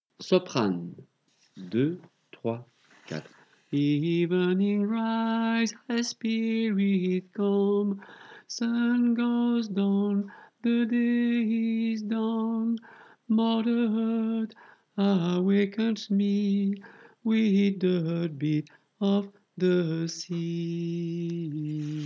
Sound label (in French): soprane, chorale